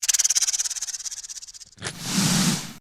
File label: snake rattle and hiss; Animals; DailySounds